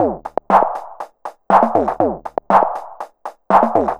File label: Drums Strange